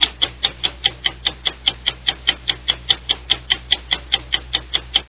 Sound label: clock, ambience; svencoop